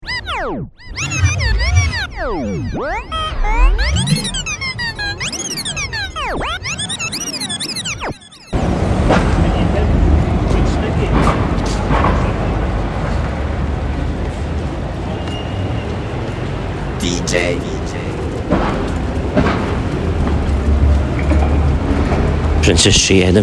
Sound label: Beep bus, Edinburgh Scotland; Ryan Frame